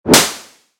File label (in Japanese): ひっぱたく ビンタ 叩く モノラル; 武器効果音